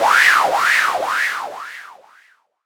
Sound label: Wah, Synth